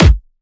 Kick, dubstep; Demolition - Dubstep Samples; Drum Shots; Apocalyptic Kick

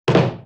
Wood Hit; Vintage Cartoon; Drums & Percussion

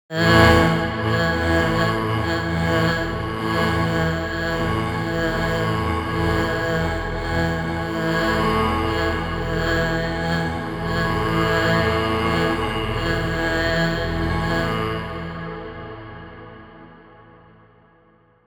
Granular Vocals - Chanting Monk - Dm 65Bpm; Granular Vocals FX; Ghosthack; SkyRealm

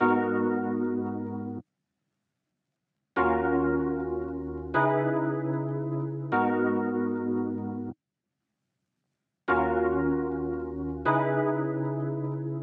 Keys, 152 BPM G# Min; Loop Stems; Cymatics Gems Vol 10; Passion; Gems Free Teaser Pack

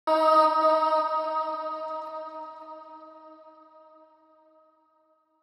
110bpm E, Vocalpack

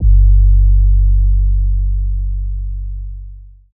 808 D; Drums; One Shots; Humble Hip Hop Sample Pack

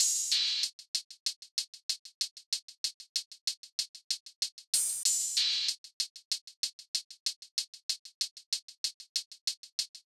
Drum Loop 95 BPM Hihat; Loop Stems; Cymatics; Vibes II Drum Loop Collection; Ultra

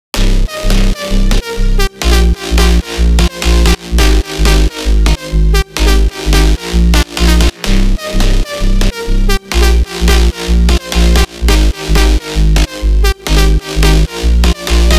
128 BPM C Min Sidechained; Cymatics; Drop Loops; Golden Hour

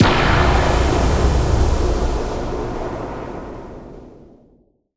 Blur Impact, Dubstep; One-Shots; Effects; Ghosthack; Dubstep Sounds 2025

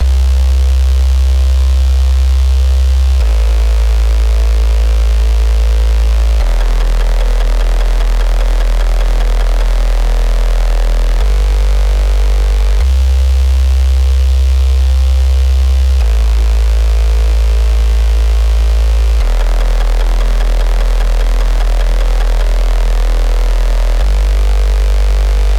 150 BPM E Min Bass, Future Bass; Cymatics; GHOST (Beta Pack); Destination

